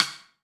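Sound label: snare drum, 180 BPM; Ueberschall; Drum & Bass Resonance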